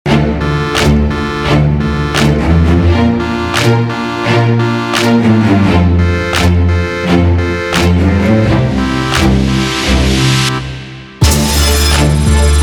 Big Cello

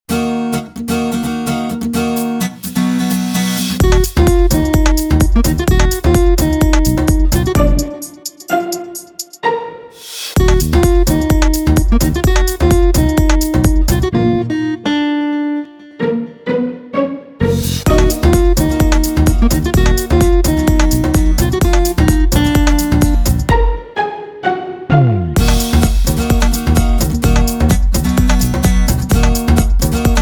Flamenco In Da House

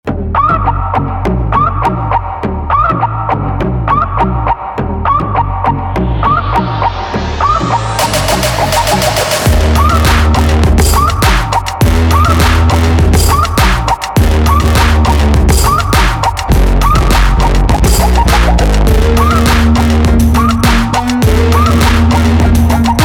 Howl

